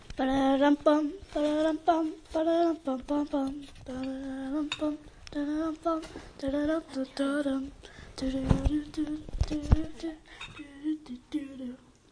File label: melody, children